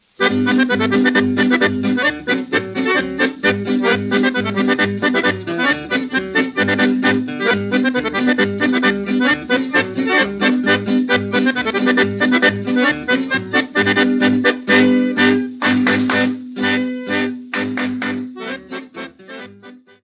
Polka - Suede

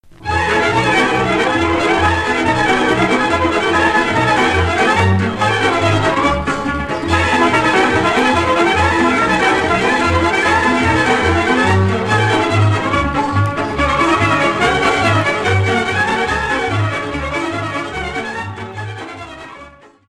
Batuta Munteneasca - Roumanie

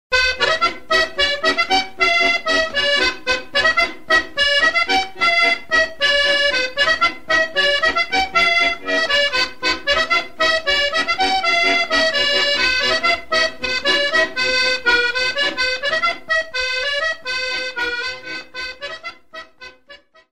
La grande bourree des foulards, france auvergne

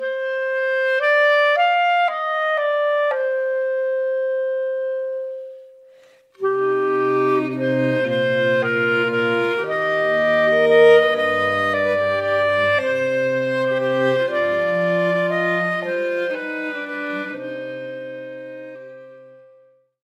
Valse, Belgique; Vieux Noel de la Fagne

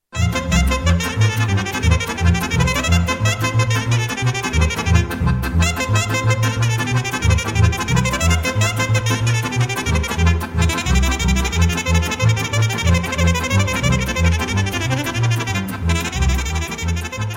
Ardeleana, Roumanie

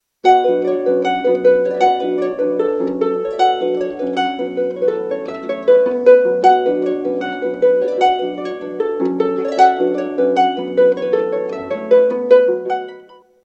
harpe celtique; instruments

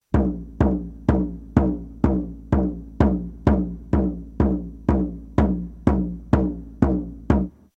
tambour de chaman

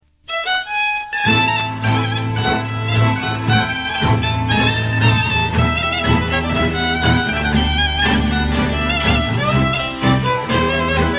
Cardas - Hongrie

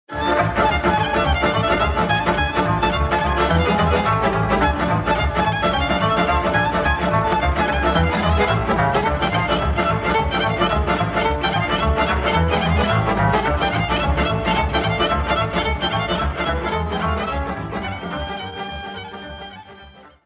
sirba - Roumanie; Tismana

